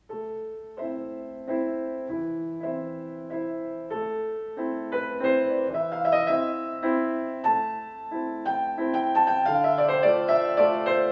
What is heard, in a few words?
Waltz